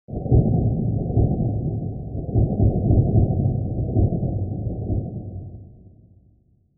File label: thunder rumble; Thunderclap